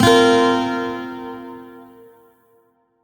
Shawzin Pent Min Chord I; Warframe; Lotus Shawzin

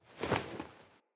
Blacksmith Hammer Strike Foley; Blacksmithing; Friendly; Tuvalkane

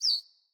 Bird Single Chirp; Galene Bird Guide

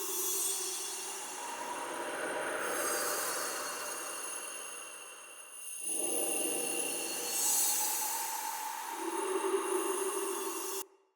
Chest Idle Loop Rare

